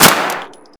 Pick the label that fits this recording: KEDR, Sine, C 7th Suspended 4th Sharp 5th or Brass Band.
KEDR